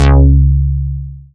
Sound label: Vengeance Ultimate Bass C#, Root C#